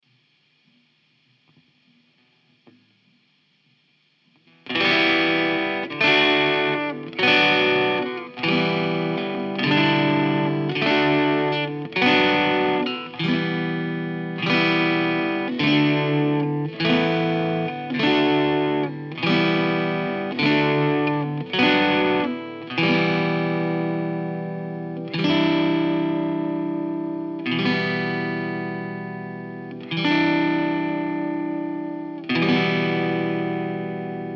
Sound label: Em100 Groove; Joans Groove